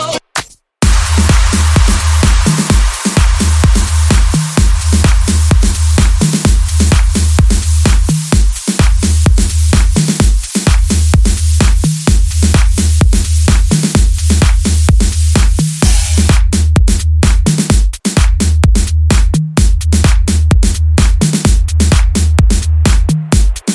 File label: Euro Beat